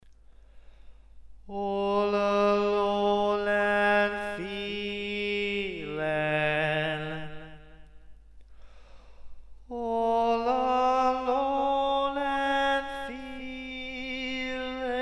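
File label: Baritone, Barbershop Tags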